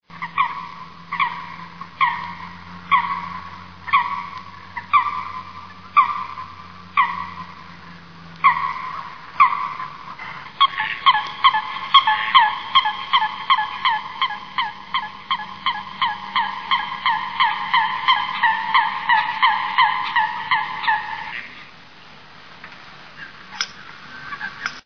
sothona

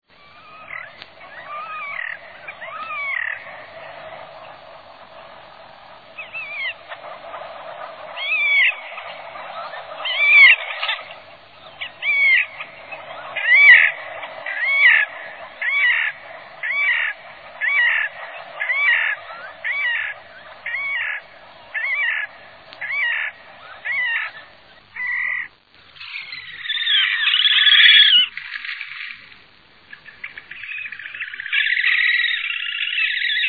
svarthaked

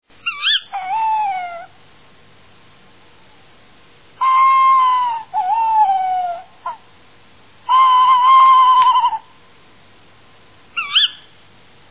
kattuggla

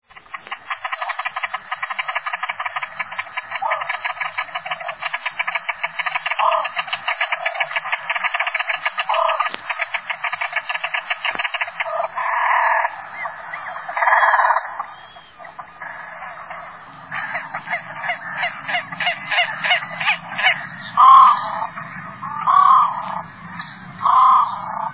skäggdopp

